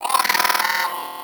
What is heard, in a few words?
Vocal Death; Hyena Micro